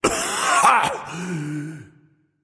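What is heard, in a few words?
nonverbal clear throat; smite; voicepack; ullr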